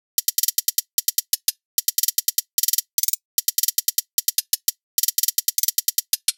Hi-Hat Loop 150; Trap and Dubstep

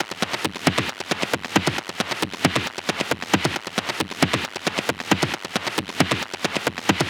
Perc Loop 135, Techno

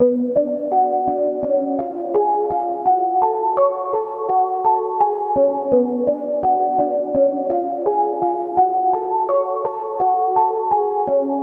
Melody Loop 168 BPM F# Min Pluck; Melodies; Loop Stems; Cymatics; Oracle Sample Pack

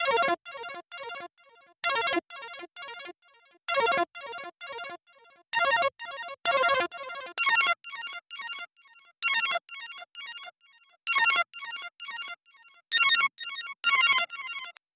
130 BPM A# Min Arps, melody loop stem; Trap; Loop Stems; Cymatics; 2022 Melody Collection; Matador

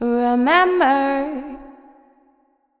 Remember, Female Vocals, Wet; Advent Calendar 2017 Day 1

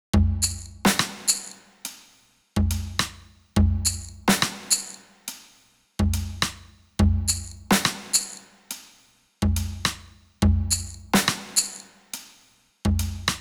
Percussion Loop 70Bpm, Lo-Fi; HipHop; Construction Kit; STEMS; Ghosthack; Ghosthack Advent Calendar - Day 4 - Lo-Fi Kit

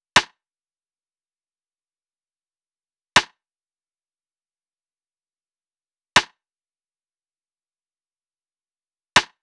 Drum Loop, 160 BPM Rim; Loop Stems; Cymatics; Vibes II Drum Loop Collection; Positive

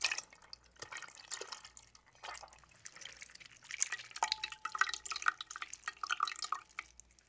Wet Cloth Being Wrung Out; Fairlight Sound Lib

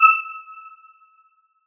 Riddim Melodic Hit E Sqeak; One-Shots; Ghosthack; Riddim Essentials Volume 2

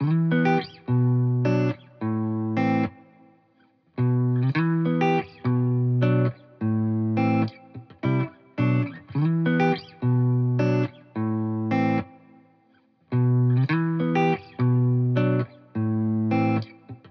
105 BPM E Min Main Guitar; Guitar Pack; Loop Stems; Cymatics; Miami Guitar Pack - Pt 1; Frisbee

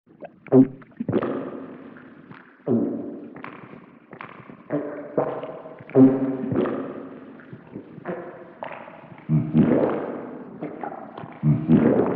Human Swallow With Reverb; Vintage Cartoon; Comedy Vocals

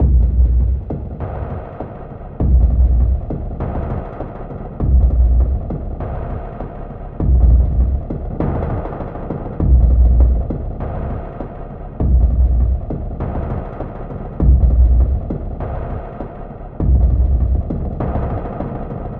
Percussion Loop 100 Abstract Cinematic; Drums & Percs; Percussion Loops; Ghosthack

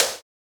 Snare, Psytrance; Snares

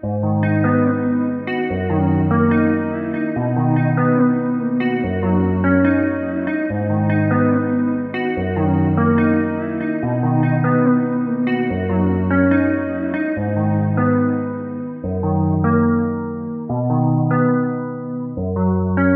Journey Guitar Loops, 144 BPM B Maj; Lazy Days; Cymatics

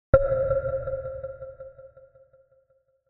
Tone

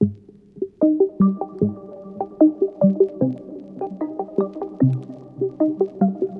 Simple Melody Loop - 150 BPM C Min Synth; Cymatics; Oracle Sample Pack

